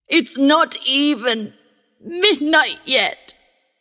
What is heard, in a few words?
death; smite; voicepack; morgan le fay